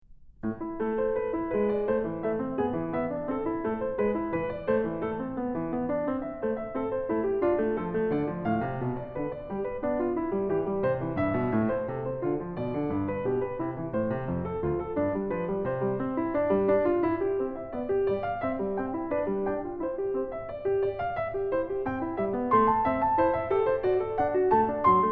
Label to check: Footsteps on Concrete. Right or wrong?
Wrong